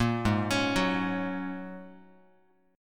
G sharp 7th Suspended 2nd Suspended 4th down Guitar; G#7sus2sus4 arpeggiated; 1,4,4,4,x,x; JGuitar